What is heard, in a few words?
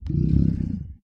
land idle, guardian, mob; Minecraft; Votive World